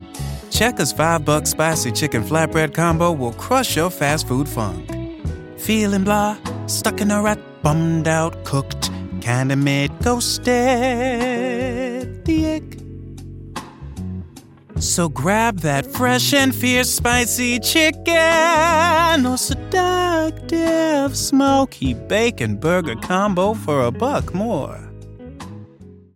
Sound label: Singers; Checkers